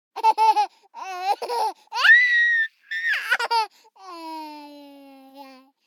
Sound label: BABIES LAUGH Boy 12 months Moving Clothes; Baby Boom